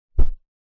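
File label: household pillow bamboo throw onto bed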